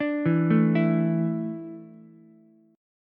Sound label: A Suspended 4th A down Ukulele; Asus4 arpeggiated; JGuitar